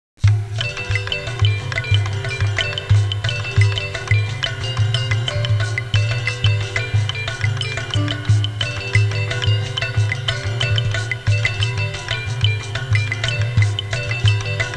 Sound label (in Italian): suonerie musica hawaiian